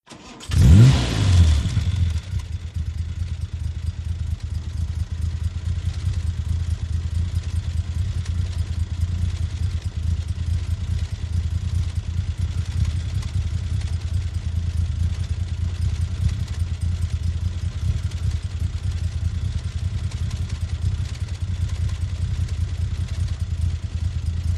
VEHICLES ASTON MARTIN: EXT: Start, idle, switch off, near exhaust